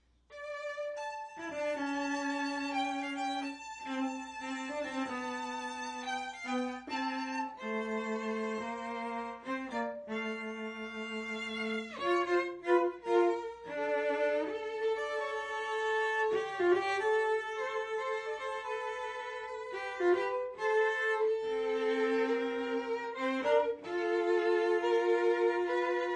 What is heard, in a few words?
violin cello